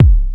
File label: 909 KICK; AKAI S-Series CD-ROM Sound Library VOL-4